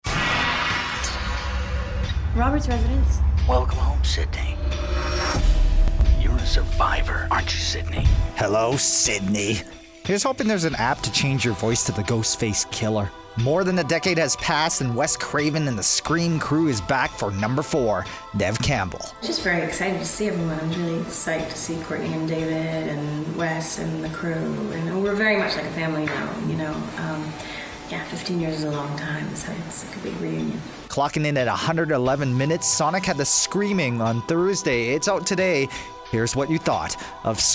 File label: Scream